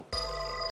pulsador; saber y ganar

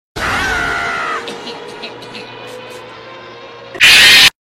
grito; bus

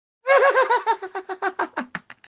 risa

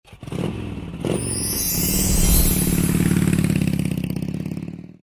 motocicleta

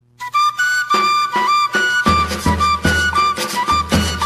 selva

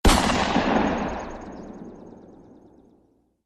disparo directo

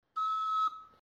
flauta

